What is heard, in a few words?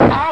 golf ow; My Science Fair